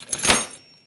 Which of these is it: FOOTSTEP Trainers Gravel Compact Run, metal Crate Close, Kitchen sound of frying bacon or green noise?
metal Crate Close